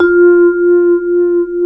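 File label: VIBRA F 3, percussion; AKAI S-Series CD-ROM Sound Library VOL-8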